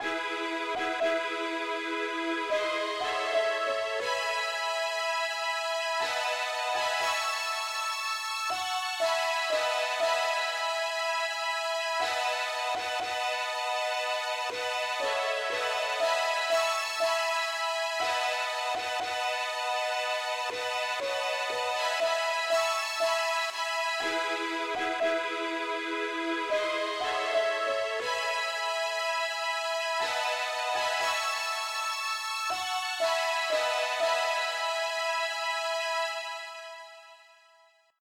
uke lead, key e; pilot

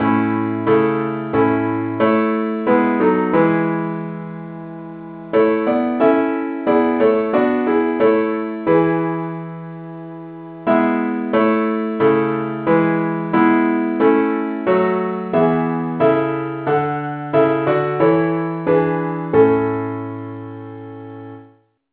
parts only key a; SAINTTHOMAS

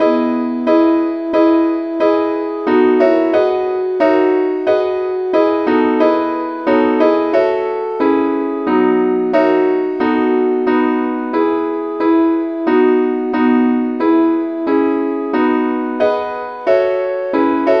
advanced lead, key E; SAINTTHOMAS

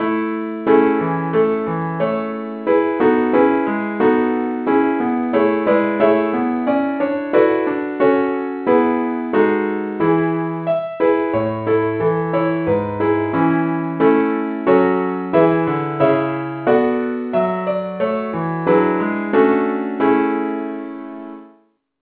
keyboard only key A; SAINTTHOMAS

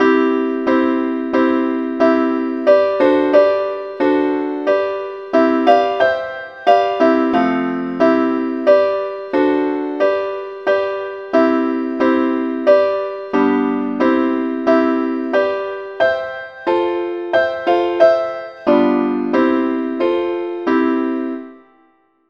simple acc key c

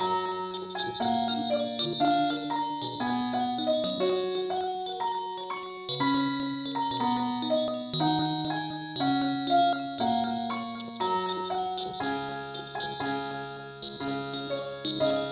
mandolin key fis

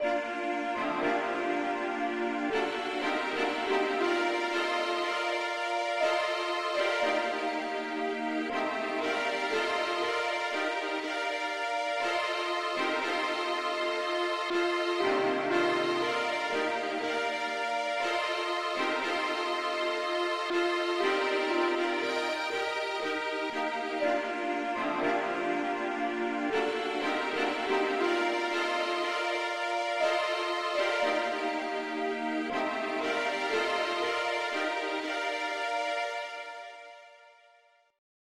pop man Lead key bes

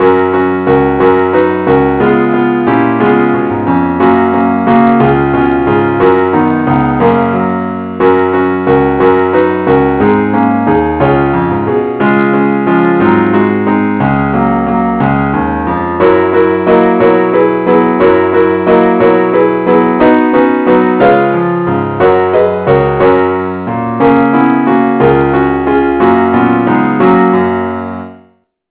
keyboard only key Fis

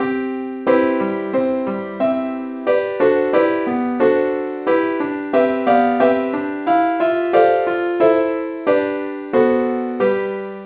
keyboard only key C; saintthomas

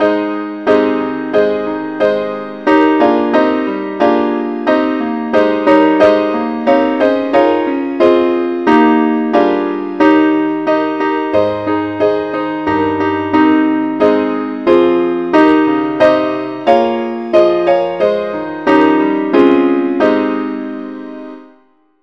keyboard key aes; saintthomas

